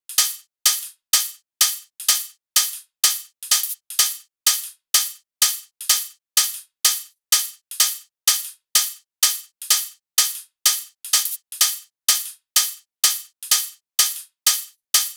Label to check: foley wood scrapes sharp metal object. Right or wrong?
Wrong